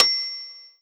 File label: Roland SC 88 Metronome Bell